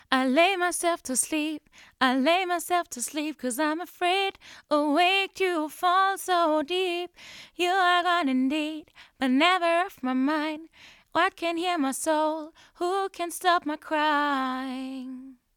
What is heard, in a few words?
vocals; beyerdynamic m160